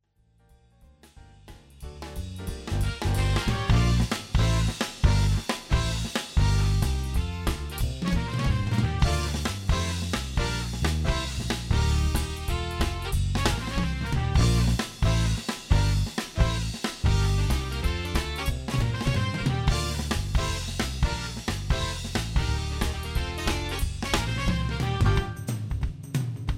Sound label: Swing